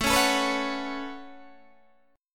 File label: A 6th Add 9th A down Guitar Open D